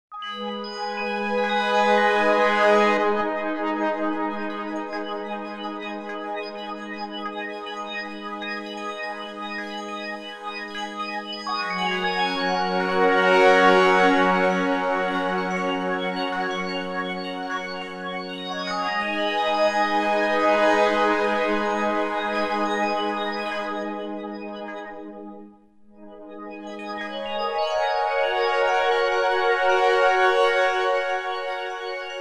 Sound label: Shimmer Lit, yamaha sy77 pads and textures